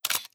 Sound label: camera click